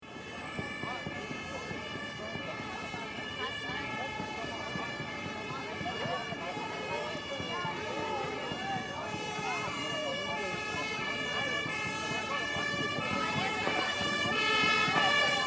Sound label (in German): Silvester Martinshorn